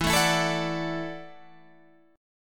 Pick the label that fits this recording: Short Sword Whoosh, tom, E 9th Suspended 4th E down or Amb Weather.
E 9th Suspended 4th E down